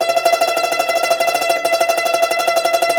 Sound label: CUMBUS TR, Cumbus Tremolo